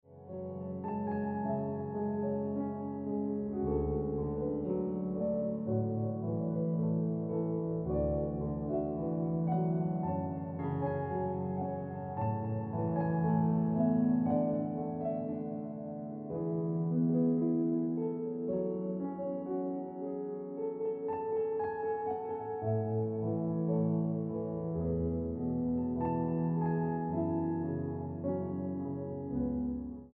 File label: Piano Music